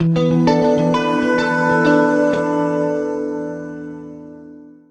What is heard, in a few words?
Guitar FX, Stringed Instruments, World Pop; Guitars; Sonic Foundry (Sony Creative Software)